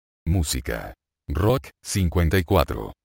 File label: rock